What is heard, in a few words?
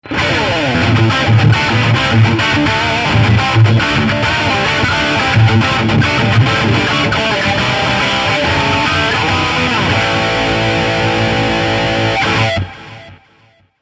Crunch Notch